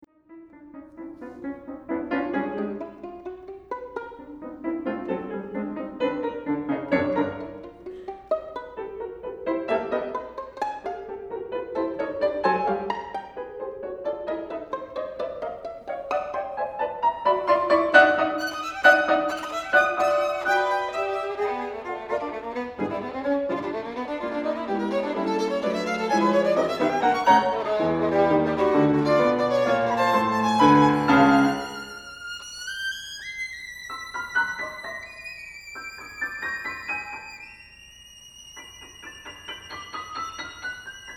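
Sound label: Sonata for Violin with piano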